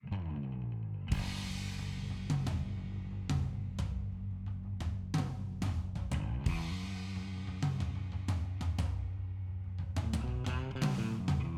BGM Warming Up; Phase1

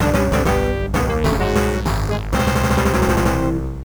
Success; Virtual Bart; Genesis

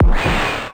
Credit SFX; Sengeki Striker; Kaneko

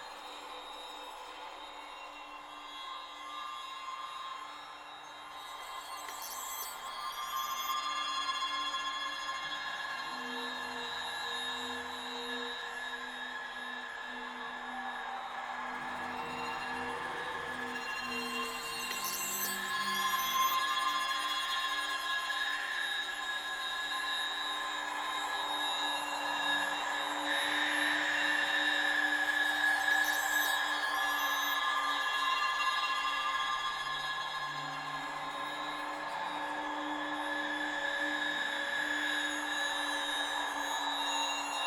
Crash Tension; Outlast 2; PS4 Windows Xbox One

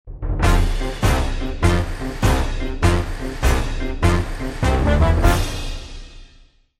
Bouncy

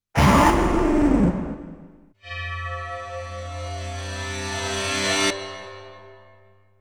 Coin ~ Stage Start

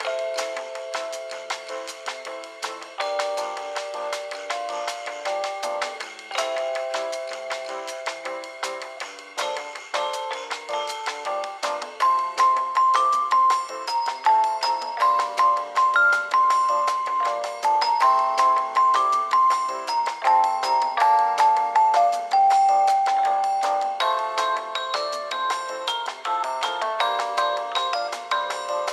Waiting Room Elevator Music Loop; hunkenstein windows gamerip 2022

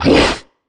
troll monster attack fast; Infernal Radiation

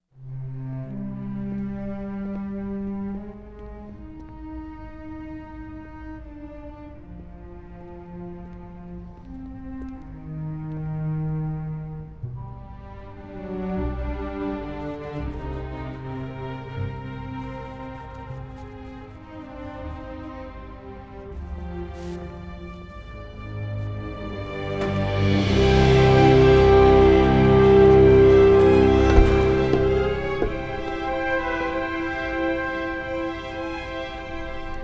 Reactor Explosion

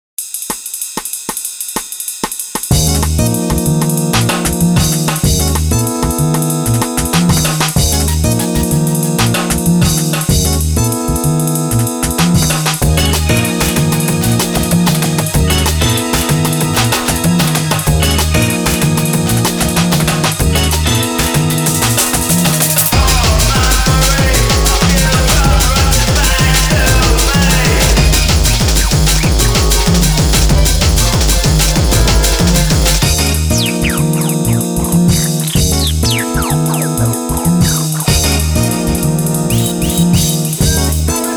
jazzy groove; Dancemaniax 2nd Mix

